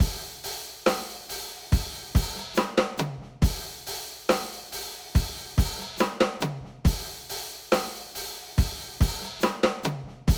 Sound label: rock